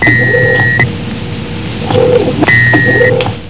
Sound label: Door